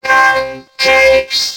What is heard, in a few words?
mini pekka deploy